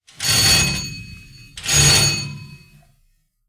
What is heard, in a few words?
Antique Doorbell